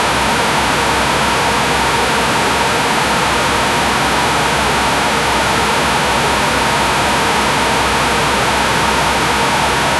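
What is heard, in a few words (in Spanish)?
sonido de estática de televisión; noise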